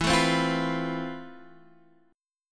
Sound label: F Major Sharp 11th E